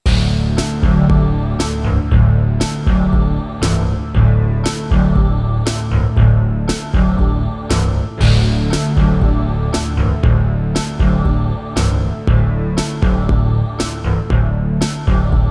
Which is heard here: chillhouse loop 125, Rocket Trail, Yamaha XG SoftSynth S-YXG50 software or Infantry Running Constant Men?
Yamaha XG SoftSynth S-YXG50 software